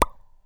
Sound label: pickup